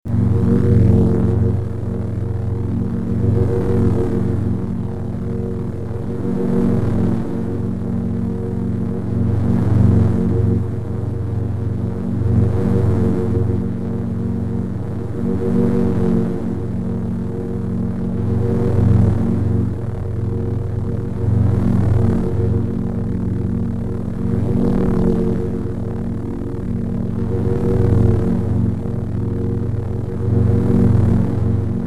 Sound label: Double Bladed Saber Sound; FXHome